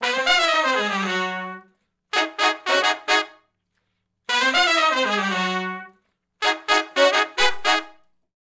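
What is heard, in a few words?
R&B Riff (Ab) unison, harmony, Horncraft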